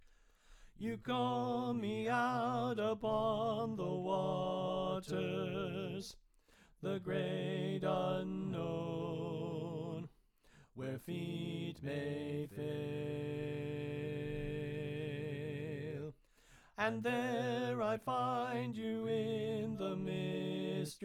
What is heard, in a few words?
Tenor Left